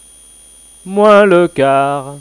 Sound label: Chime; Chimes Bells